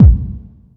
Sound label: BD, B. DRUM, House Side Of 2 Step; USB Soundscan vol.51; AKAI